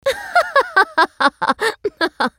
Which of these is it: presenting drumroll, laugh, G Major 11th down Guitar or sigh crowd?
laugh